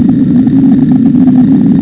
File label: airball